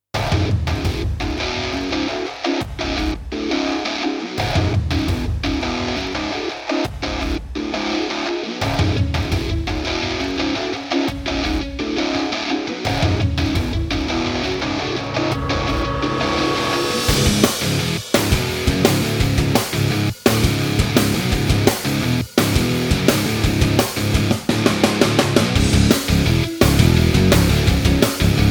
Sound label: TV ElectroRock; Base Productions